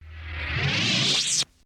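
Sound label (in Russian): выключение лазерного меча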